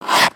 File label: ice skate